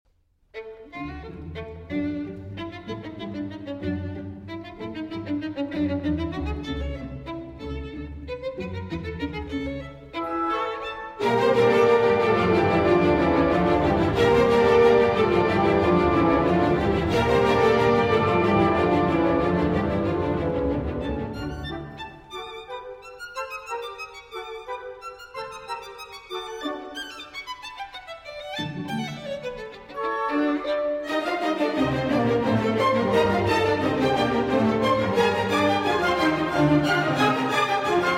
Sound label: Violin Concerto, Rondo, Allegretto Scherzando